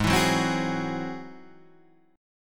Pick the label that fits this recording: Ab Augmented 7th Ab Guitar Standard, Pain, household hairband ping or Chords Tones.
Ab Augmented 7th Ab Guitar Standard